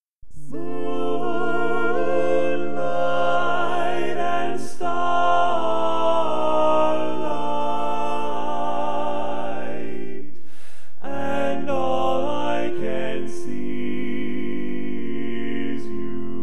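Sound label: Baritone, barbershop tags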